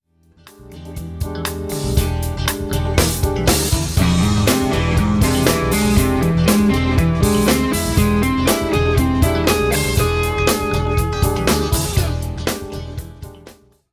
Fretboard Navigations, Guitar Techniques; Guitar Techniques 2019 Issue 297 July

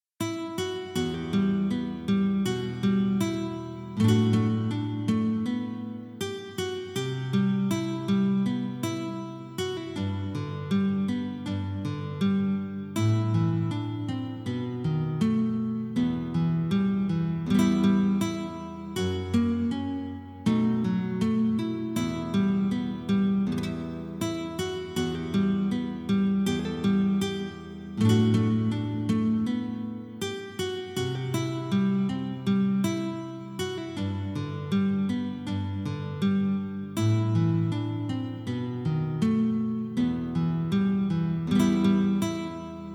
sad love story guitar; Bunny's Guitar Tabs

